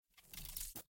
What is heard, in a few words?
Fusebox Spark Fade In